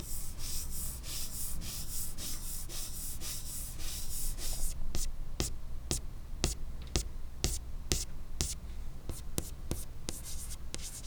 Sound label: Chalk